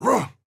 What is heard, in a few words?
Attack Small